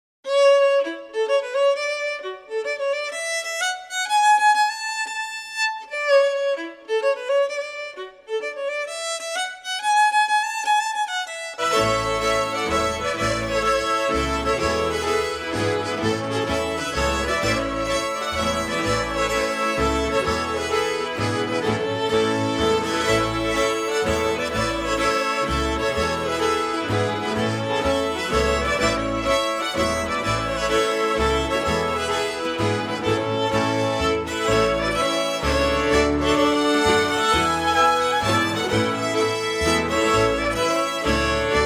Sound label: Swedish Waltz; supergrandpa